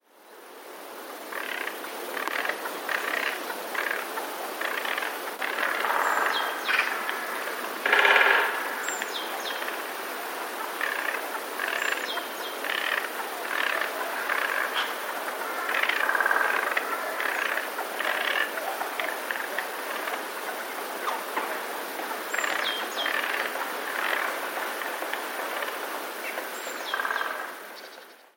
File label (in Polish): sojka; ciche krakanie